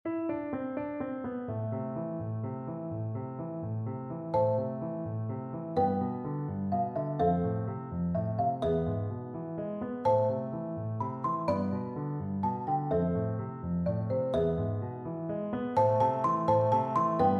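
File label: spanish violin marimba, percussion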